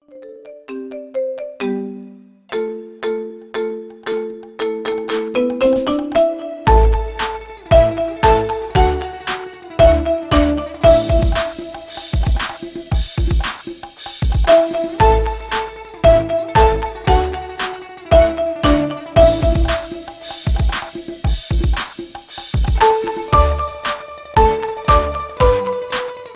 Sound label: marimba ringtone remix